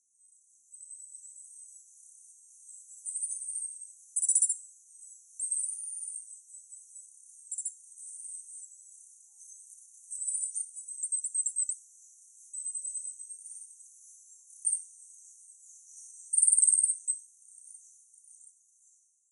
hirara; Utsunomiya

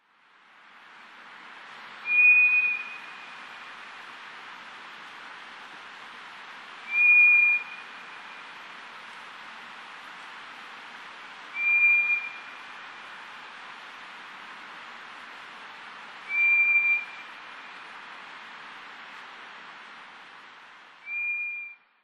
toratsugumi, mitake

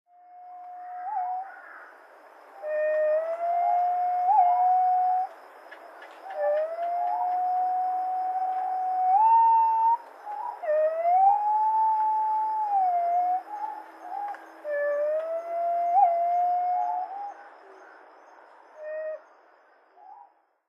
zuaka; taketomi

